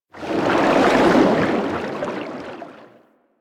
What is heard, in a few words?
water movement by; Warframe; Vehicles; Sharkwing